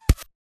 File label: imp bullet lightballistic impactsweetener; Titanfall 2